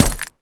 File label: glass shards run